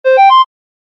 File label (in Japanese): 場面切り替え 笛風 遅め